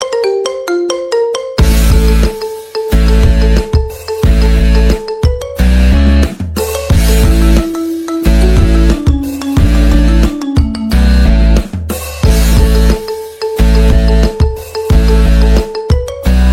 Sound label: marimba; Marimba Remix